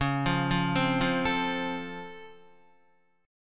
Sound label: C sharp Augmented Major 7th C sharp down Guitar, chord; C#+M7; arpeggiated; JGuitar